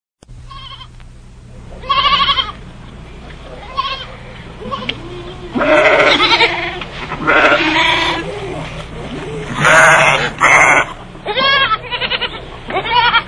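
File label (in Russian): zvuki baranov i ovec, rams and sheep sounds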